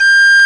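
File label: recorder 5A, woodwinds; RECDR; Roland - LA Composer vol. 1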